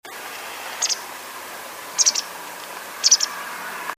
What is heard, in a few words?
Motacilla alba